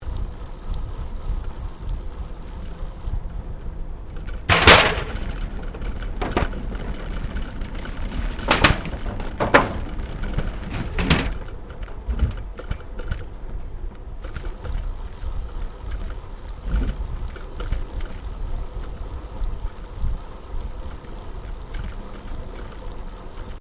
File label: Bicycle Riding Metal Ramp Stereo, 21 speed road bicycle; Giant Crx3; Bicycles